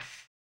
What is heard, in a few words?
HAMBONE; Blues & Soul